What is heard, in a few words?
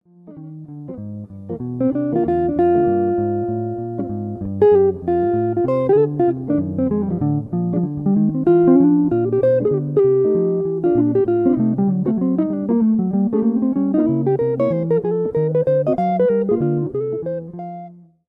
blues